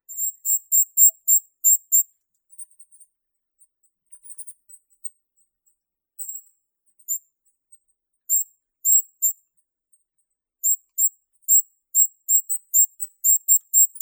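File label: goldcrest, regulus regulus; korolek zheltogolovii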